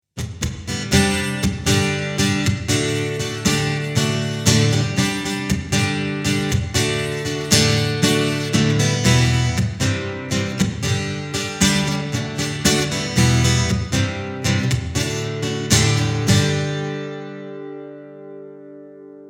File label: Reverb